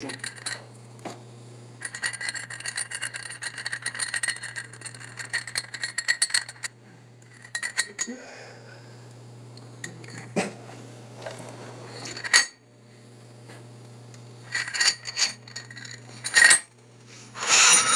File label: metal spoon stirring inside a glass